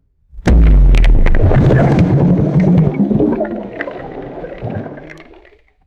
muffled underwater explosion and rising bubbles; explosions

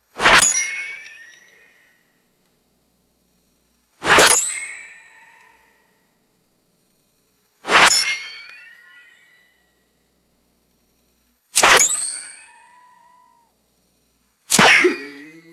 sharp metallic bullet ricochets and whizzes; bullets

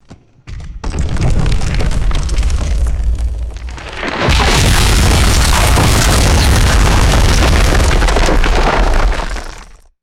heavy rock debris tumbling and crashing; destruction

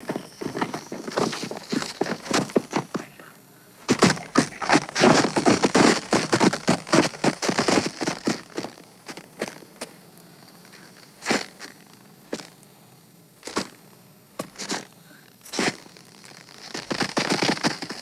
scrambling and stepping on loose rocks; foley

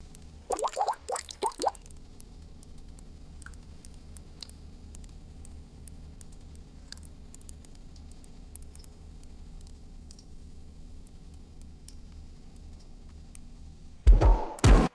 single liquid drips with varying pitches; liquid mud

